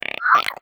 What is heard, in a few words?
idle chirp, headcrab, creatures